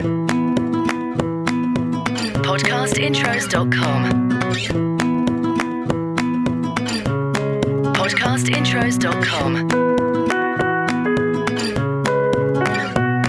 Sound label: Podcast Watermark FUN Backyard Lullaby